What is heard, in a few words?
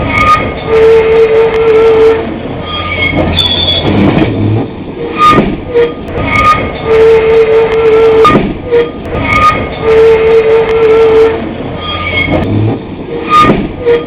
Flange, Train; OpenBVE; BKV 1948-1949